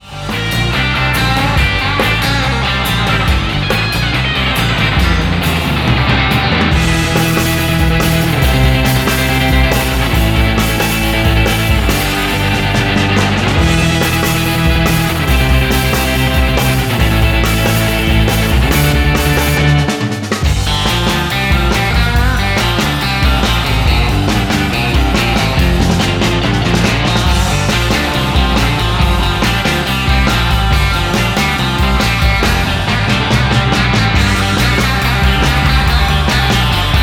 60s Surf

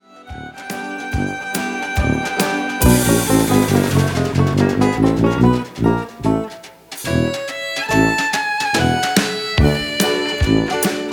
Quirky

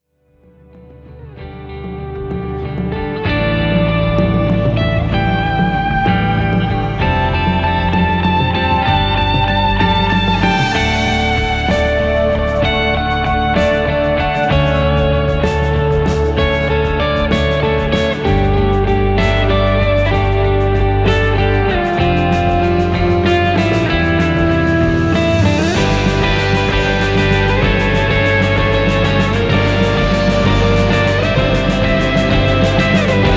Cinematic Guitars

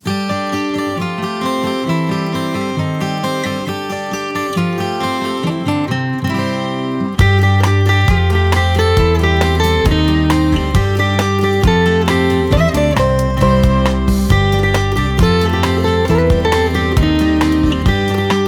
Acoustic

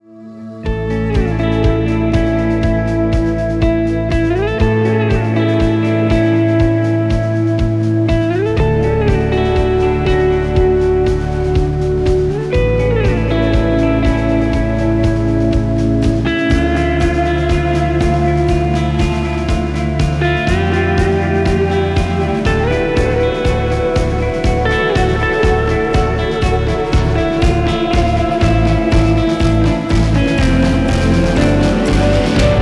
Dramatic Guitars

